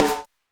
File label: HyperSpace, Beyond Snares; Airwindows